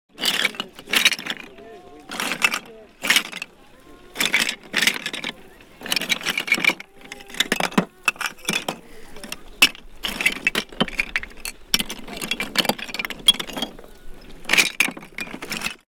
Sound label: Oyster shells with baby; whitstable